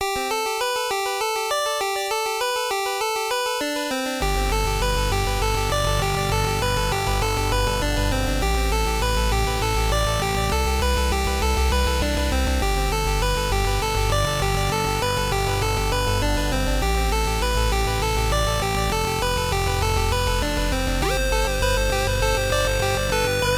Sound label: Lobby loop; Earthnight